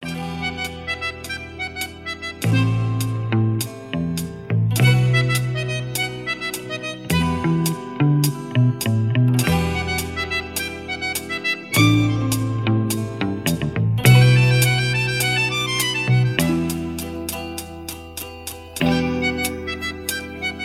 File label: instrumental